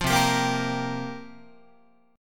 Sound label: C 7th Suspended 4th, Guitar, chord; C7sus4 strummed